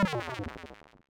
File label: lose; pyrogue; Oryx; interface